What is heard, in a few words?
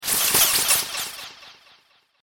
Abstract Sweep Sounds, Whoosh